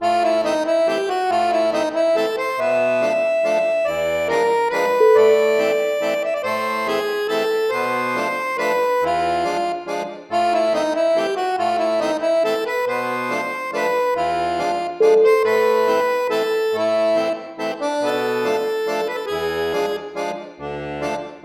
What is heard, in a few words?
french waltz